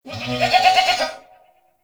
Ram Ambient Call; Animals; Duviri

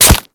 Kubrow Melee Impact Flesh

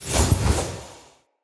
Staff Caster Charge Heavy Start Long, Weapons; Player; Staves; Galene

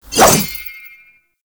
Sword Swipe; Warframe; Duviri; Dax; Weapons

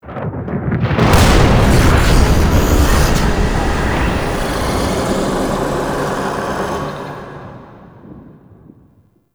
Exterior Thunder Clap; Ambience; Warframe; Duviri; Murmur

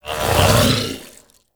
Dodge Cast End; Warframe; Pacifist

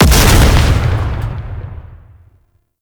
Explosive Summer; Gameplay; Warframe; Nineteeen Ninety Nine; Deep Archimedia

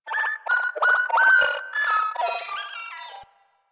Venus Corpus Data, Ambience; Warframe; Venus Open World; Venus Landscape

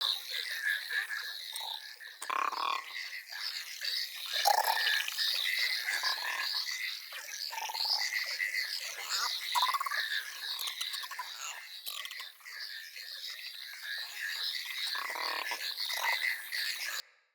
Critter Loop, Root Cave Ambience; Neathuns

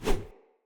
Short Sword Melee Whoosh Fill; Enemy; Weapons; Short Sword Elite

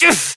Generic Short Pain Operator Voice

